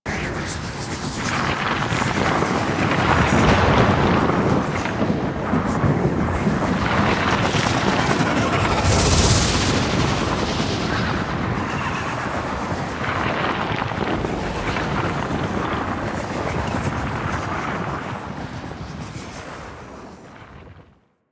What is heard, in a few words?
Void Amb; Deadlock Protocol